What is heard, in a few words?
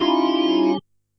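organ stab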